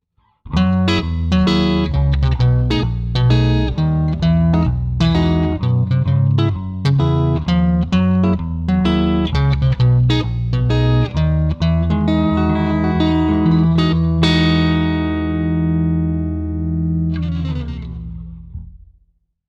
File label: e jazz back